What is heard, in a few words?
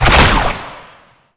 Ranged Weapon Fire; Warframe; Necramech; Weapons